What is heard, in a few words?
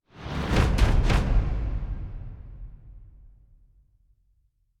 epic start 3 hit; combat